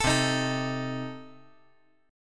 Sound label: C Minor 6th C